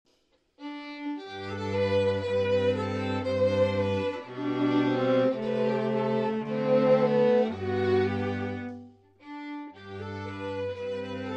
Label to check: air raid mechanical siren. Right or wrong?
Wrong